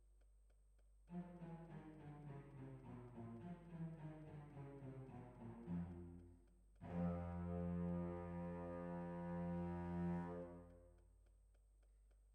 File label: Violins, jazz for piano